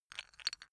chess pieces rattle

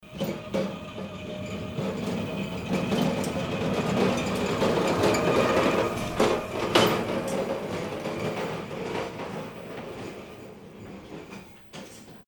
forklift truck passing

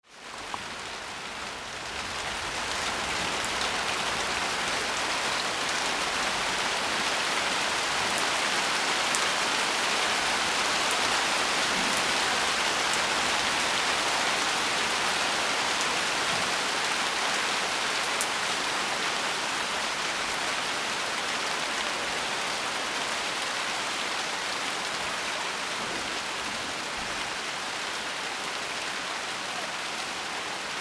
rain in little town

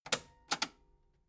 metal button cassette stop slow

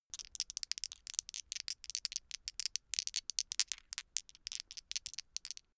dice shake hands open long

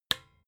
desk lamp on

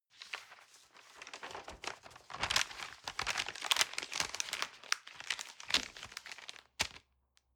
fold key into paper

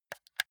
console controller r1 press slow

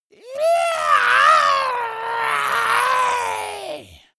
monster scream high

